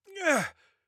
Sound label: Grunt Jump; Torvald; Paladins